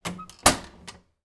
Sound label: household door wooden internal open; sound effects one